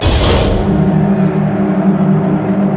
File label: black hole loop; weapons; dota; enigma